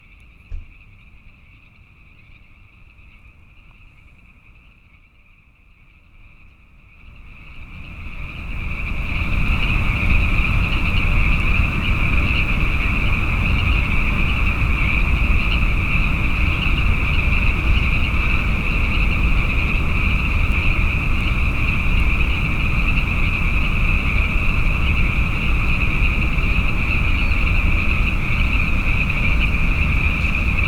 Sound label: Frogs, binaural; Yahoo!